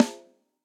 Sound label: Snare Hit; Drum Hits; Standard Hits; Cop Style; Ableton; Factory Packs; Session Drums Studio